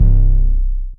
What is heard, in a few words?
808 fat C#; 808s